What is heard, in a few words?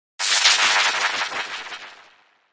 Impact Pulsating